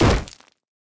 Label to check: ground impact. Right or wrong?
Right